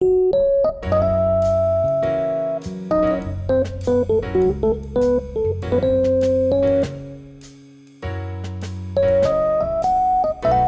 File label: night relaxation, romantic jazz piano music